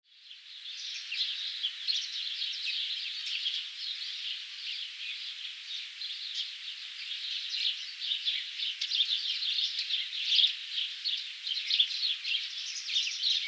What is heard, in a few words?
forest, ambient